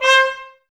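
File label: trumpets C, brass; 90 s Sample CDs; Miroslav Vitous; Woodwind Brass Ensemble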